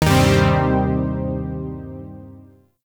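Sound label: Shawzin Pentatonic Minor Chord; Zariman Shawzin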